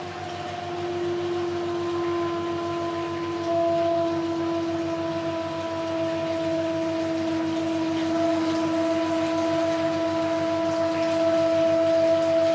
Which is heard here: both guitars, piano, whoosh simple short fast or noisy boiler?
noisy boiler